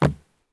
landing wood; Escape from Tarkov

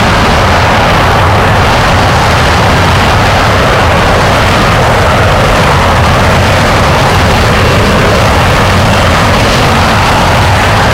jet engine rpm99; War Thunder

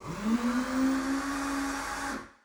Aircrafts Mi-8MTV2 Fuel Shut off left; DCS

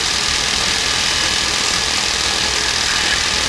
rotor m61 loop out

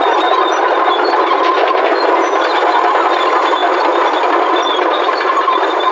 Vehicle Engines; Red Orchestra Ostfront 41-45

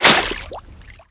foot water shallow, footsteps

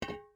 ui drop metal hollow large; Rust

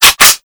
reload chamber, foley; miniuzi

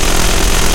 mi35m 23mm gun loop; guns; tanks; War Thunder

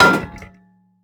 metal; Rust

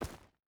footstep hide stones run; Rust

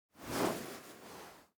eoka pistol deploy; Rust

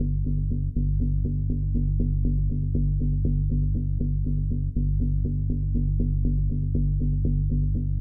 music interactive; World of Tanks

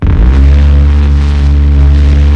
M61 cycle; Weapons; DCS

